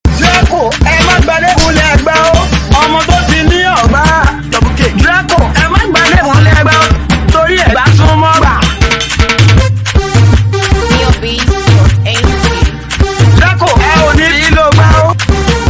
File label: Dreco Beat